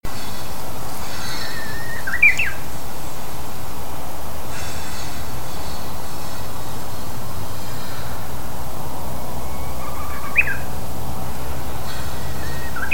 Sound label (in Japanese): uguisu